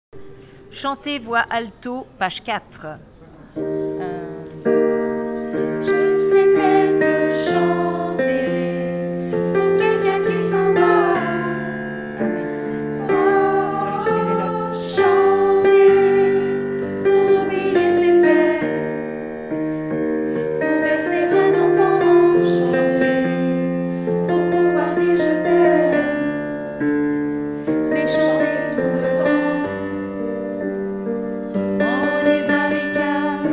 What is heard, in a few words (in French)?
chanter alto